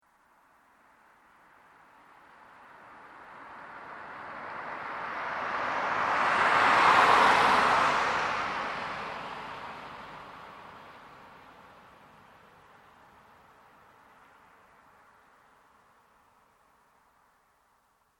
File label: car